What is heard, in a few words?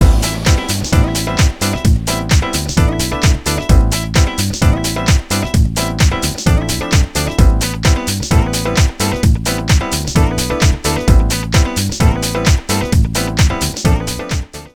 UK House; Grooves